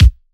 128BPM Latin Kick; Latin E Kick

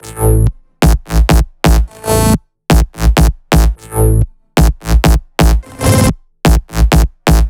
128BPM The Big Groove Melody; Vengeance Samples

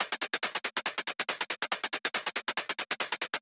Beast Kit 140BPM Loop, Drums & Perc; D minor; Vengeance Samples

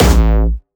A1, Jumpstyle Kick; vengeance essential club sounds-3; VEC3 Bassdrums

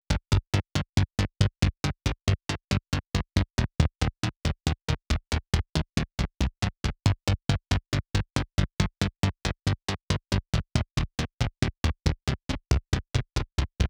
Bassline 138BPM, Gminor; Vengeance Samples; VTS1 Outlaw Kit

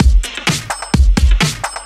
Housebeats, 128BPM; Vengeance Samples; VEH2 Loops

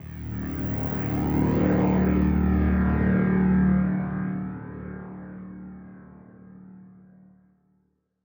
Pad; Ghosthack

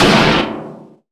Impacts

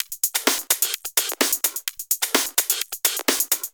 Houseloops 128BPM; Vengeance Samples

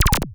Roland E Blip, Roland S100; musicradar-synth-samples

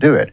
Vocal Phrases; Vengeance Samples; Vocals

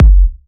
Kicks; Vengeance Samples

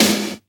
Snares; Vengeance Samples; VEH2

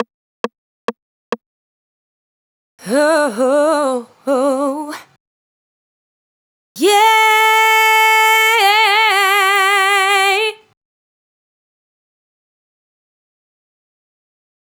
Adlibs; Vengeance Samples

